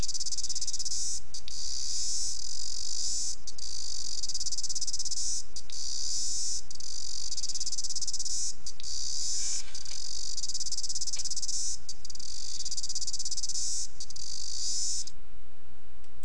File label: cicadas; el sol de los scorta de laurent gaude